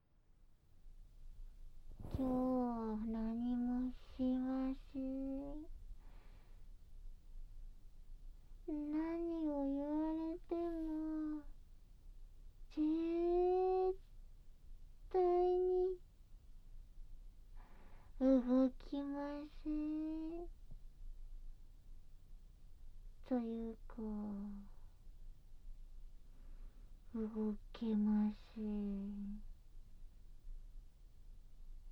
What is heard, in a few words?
ZZZzzz…